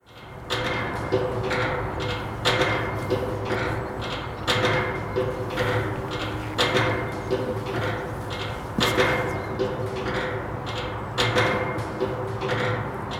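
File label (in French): Vent, Paysage sonore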